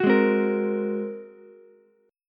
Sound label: G sharp 9th G sharp Ukulele C6; G#9 strummed; 11,8,8,13; JGuitar